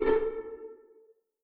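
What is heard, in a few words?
string pizzicato A3 pianissimo right; Sound On Sound - Peter Siedleczek's Orchestra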